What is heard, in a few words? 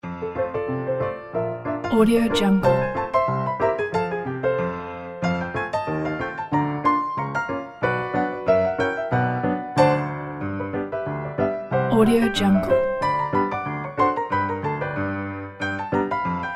ragtime piano; music-abc